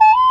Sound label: BA WU A#3